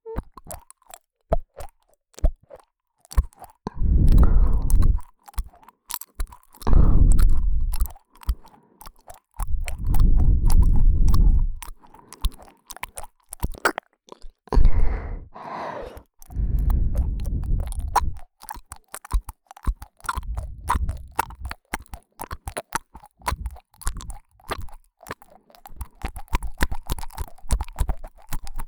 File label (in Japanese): ビタビタ（舌ビンタ）, ASMR, 右耳